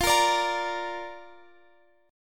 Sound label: Bb Major F